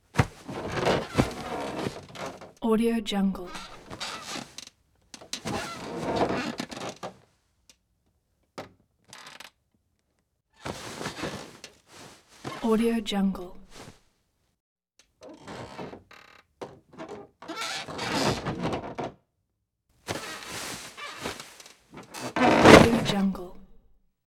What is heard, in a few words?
bed movement; prosoundfx